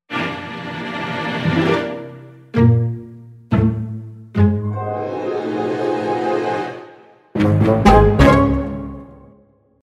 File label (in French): tension attente fin